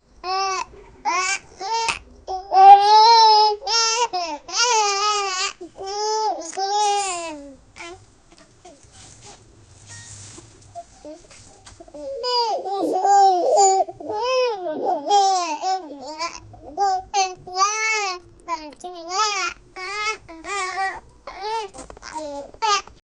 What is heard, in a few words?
baby elephant; saying cucu; animal vocal; SFX Engine